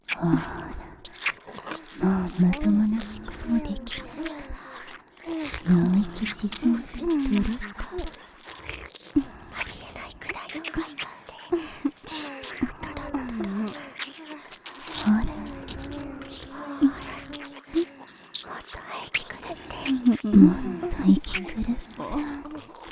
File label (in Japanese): ループ 左吐息＆右耳舐め＆ダブルフェラ＆二人で言葉責め; 人外姉妹のダブルフェラ～囁き声と耳舐めで脳みそトロトロになるまで嫐り犯してあげる～; 妹すたー